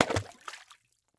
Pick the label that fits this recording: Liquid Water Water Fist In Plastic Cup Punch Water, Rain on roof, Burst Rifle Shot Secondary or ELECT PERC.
Liquid Water Water Fist In Plastic Cup Punch Water